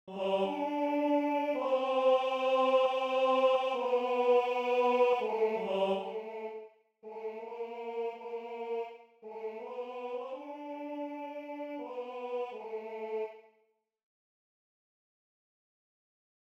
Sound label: tenor